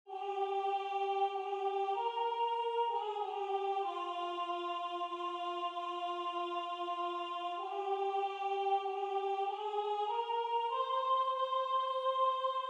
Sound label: Sah ein Knab ein Roslein stehn Soprano